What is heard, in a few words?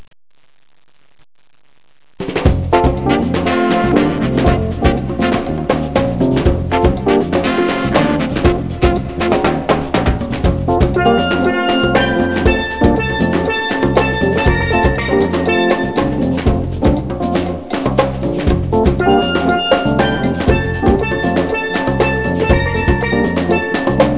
steel drum band